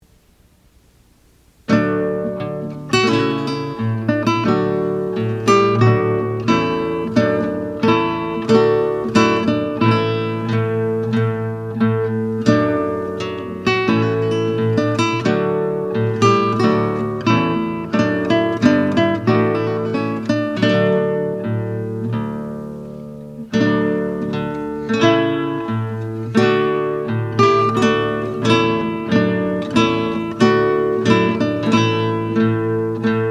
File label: Guitar Duo